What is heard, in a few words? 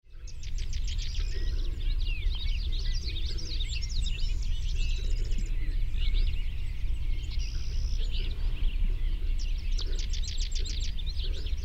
Pasture; Port Wing